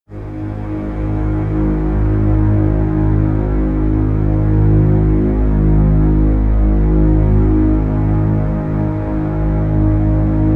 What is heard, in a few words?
drone simple double bass